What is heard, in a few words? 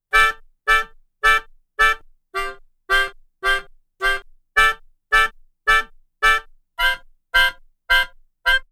Melodica Rhythm, African; Sonic Foundry (Sony Creative Software); Continental Drift Aftershocks